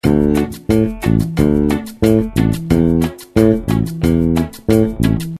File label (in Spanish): Patron bajo cumbia; how to play cumbia bass